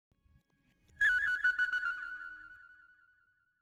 Birds, Hip Hop Exotica, Apple Loops; Big Fish Audio

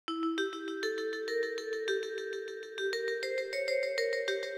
Bells, 100 Emin, Hip Hop

